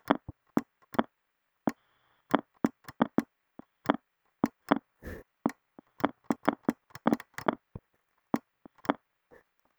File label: Noise